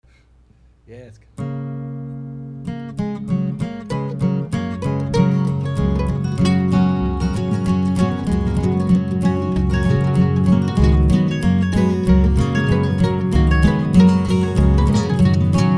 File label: Rain Jam